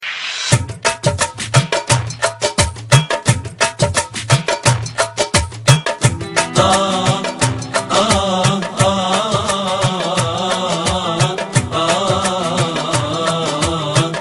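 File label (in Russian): исламская музыка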